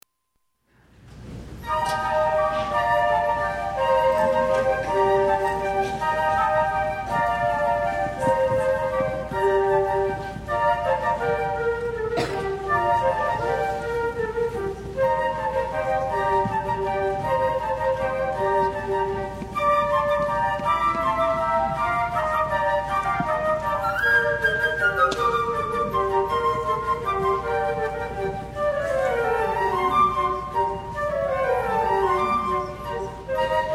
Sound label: Carol of the Bells; christmas